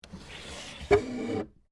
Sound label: Drawer Open Desk, Foley, Drawer, Open, Antique, Wood, Desk, Small; Drawers